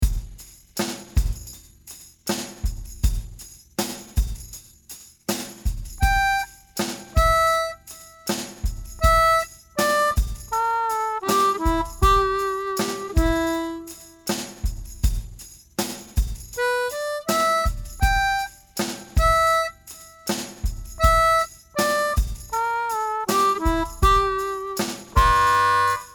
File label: slow harp